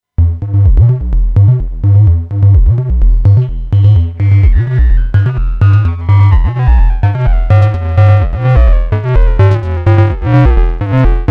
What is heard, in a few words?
Acid8 MKIII Crush FX